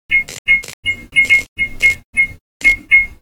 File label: light loop